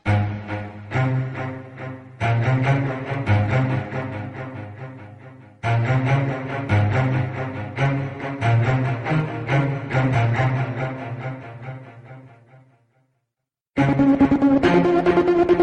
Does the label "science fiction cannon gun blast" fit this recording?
No